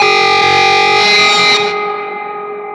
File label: siren; 2fort